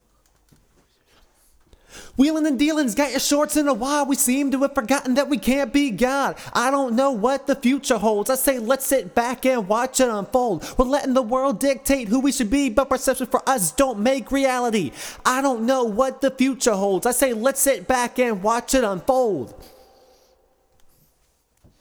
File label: chorus lead, remix